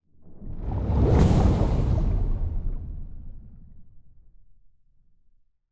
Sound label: titan move; aquatics